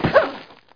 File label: land; Daikatana; mikiko